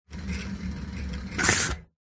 bicycle pushbike front wheel brake stop sudden close up; vehicles; zapsplat